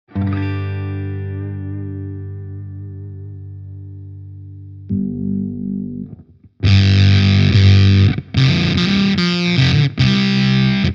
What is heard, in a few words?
JHS Mary K; Freq. 12 h; JHS Mode an